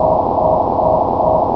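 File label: elevator move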